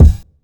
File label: dry kick drum single shot g key; Duration 0:01; Samplerate 44100 Hz